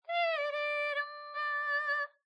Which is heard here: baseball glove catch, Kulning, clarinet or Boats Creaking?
Kulning